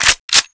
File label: boltpull, weapons; kriss sv